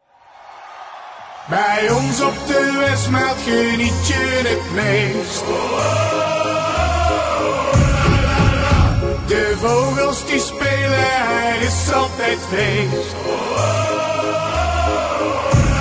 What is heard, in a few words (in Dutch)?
Clublied; Ijsselmeervogels